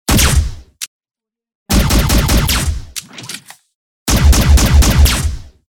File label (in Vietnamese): Tieng sung laze ban